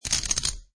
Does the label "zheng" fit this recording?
No